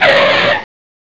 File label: Tyre Squeal; Flight Over Snowdon